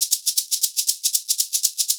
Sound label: 120 SHAK, Percussion Loops; USB Soundscan vol.36; AKAI; SHAKER